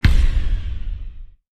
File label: hit electro; Viking Escape